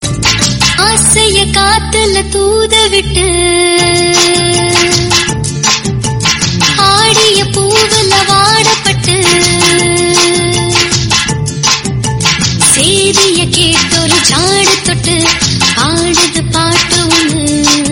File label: Tamil remix ringtone